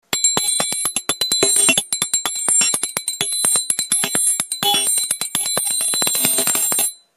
Kleine Triangel

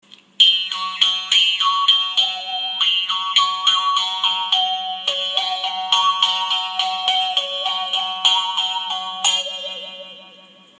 Mundbogen Holz

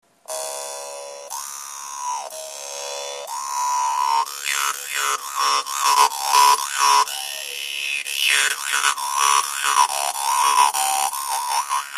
Doppelzungige DanMoi

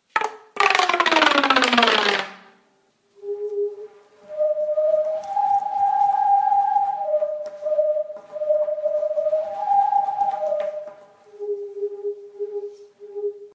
Heulschlauch

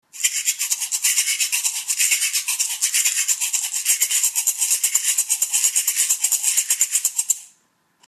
Bambusshaker Geschlitzt Klein

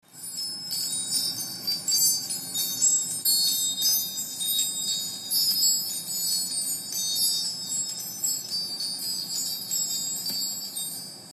Kosmos Klangkugel

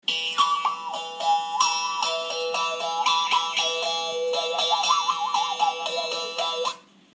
Mundbogen Holz 90

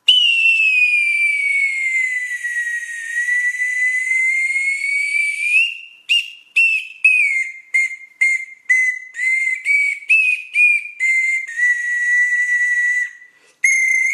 Bambus Lotusflote Mini

